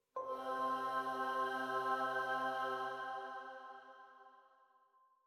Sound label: Cave Music, Energy Melody Note C3